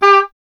E. Horn

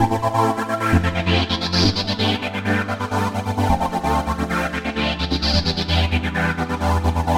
Juno Pulse; Dr. Fink's Funk Factory

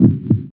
Heart Beat; Roland BK-5

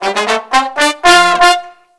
BRASS LICKS, Disco Fever; Best Service ProSamples vol.48 - Disco Fever; AKAI

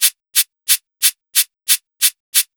DRMS, Pure Electro; USB Soundscan vol.03; AKAI

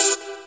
SYN Zisching; Best Service Gigapack 1

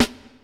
TR kit, techno kit; Pro-Rec - Synthsonic

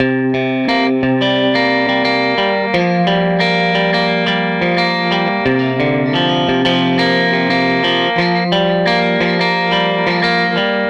Tele Guitar Loop; Sonic Foundry (Sony Creative Software); Six-String Orchestra

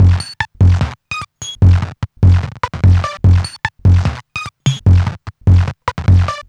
Modular Loop, Modular Percussion; Sonic Foundry (Sony Creative Software); Synchro Funk

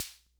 Snare, sr-95; classic machines

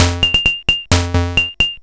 Rhumba, Hing-Hon EK001

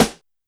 Snare Rim, YAMAHA RM1X

